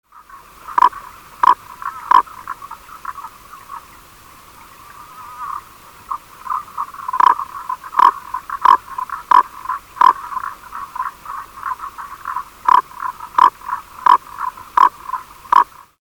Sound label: frosti, frogs; Baw Baw Frogs